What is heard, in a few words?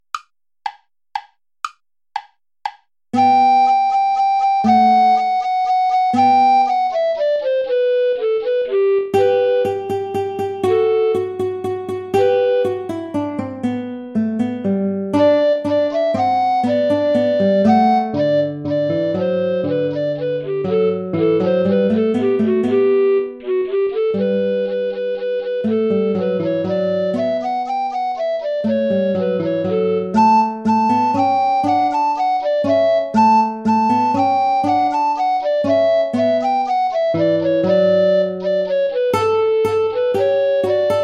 play along without guitar; alter ungarischer tanz